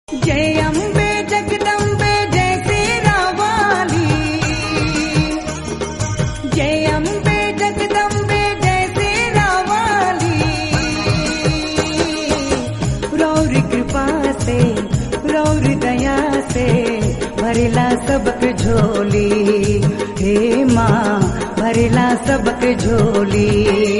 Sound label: MAA DURGA NAGPURI BHAJAN RINGTONE